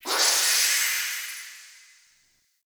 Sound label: spawn machine item disintegrate; ambience; Int Echoes; Warframe; Entrati